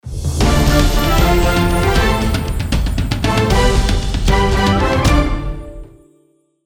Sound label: Game Victory